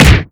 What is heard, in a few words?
Smash Hit Weak